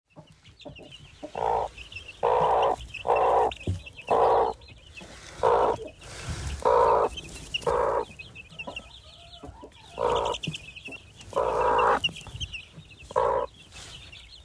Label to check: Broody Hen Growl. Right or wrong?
Right